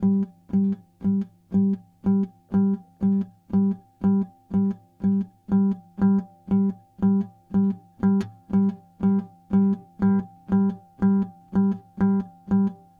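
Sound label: Gm 120 guitar harmonics; GTR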